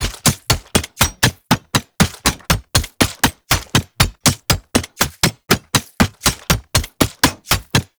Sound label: Wall Climb Movement Step Loop; Warframe; Oraxia